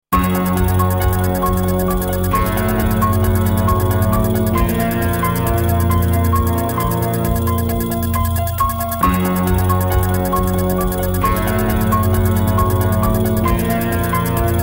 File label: ringtone; halloween 1978